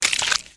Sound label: Squelch